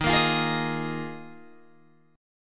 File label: Eb Suspended 2nd Suspended 4th, Guitar, Open G